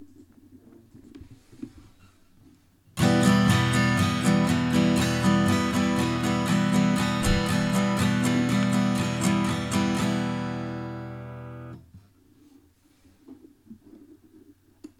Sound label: em down up down up, guitar